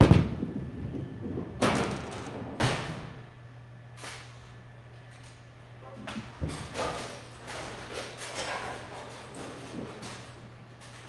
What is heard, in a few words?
bowling roll gutter ball bowler perspective; fienup; sonniss gdc